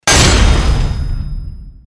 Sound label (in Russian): udar